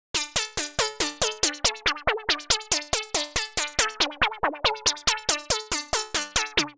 Off Track FX C Minor 140 bpm; MIDI Clips; Ableton; Factory Packs; Skitter and Step

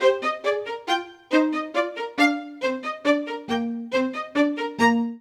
Ostinato Twisting C Minor 138 bpm, String Quartet; MIDI Clips; Ableton; Spitfire Audio

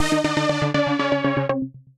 Saw Spiral, effects

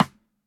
SNARE side stick

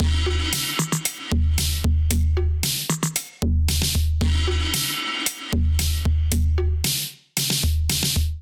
Kit 114 bpm, Drums; MIDI Clips; Proto-Clash Kit; Drive and Glow; Ableton; Factory Packs

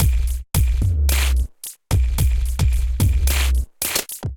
Kit groove 110 bpm; Kimbo Kit groove 01 110 bpm; Ableton; Factory Packs; Drum Essentials

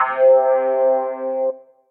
Suitcase Piano; Suitcase Crispy Afterburn; Ableton; Factory Packs; Electric Keyboards

